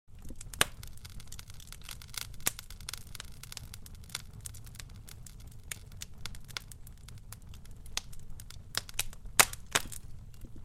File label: Fire crackling and burning